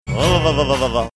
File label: making funny sounds